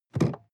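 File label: sports car interior seat unlock; verkehr transport; autos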